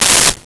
Hydraulics, Mechanical

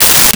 Aerosol Can, Bathroom; 1001 Sound Effects

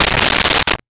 Book Page Turn; Office Supplies; 1001 Sound Effects

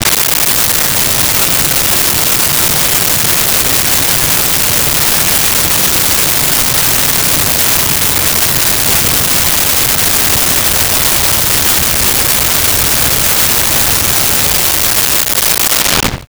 Blizzard; Ambience

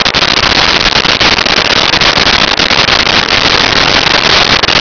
surface snow loop

